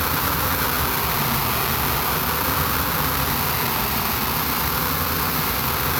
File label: Panned Noise, non tempo loops